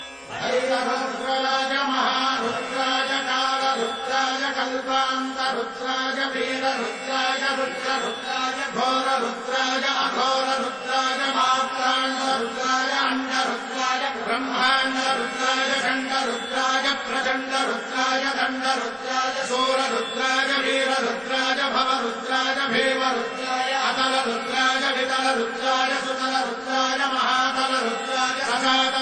maha rudraya kaal rudraya kalpaant rudraya veer rudraya rudra rudaraya ringtone